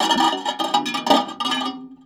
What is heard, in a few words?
Raw, Tin Of Guitar Strings, contact mic; musicradar; contact mic madness